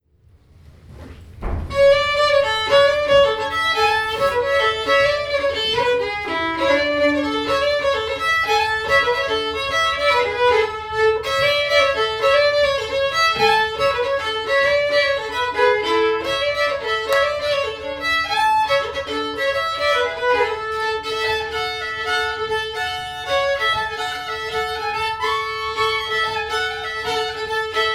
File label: Varmland Polska